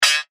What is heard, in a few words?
Beep Tone Signal Multimedia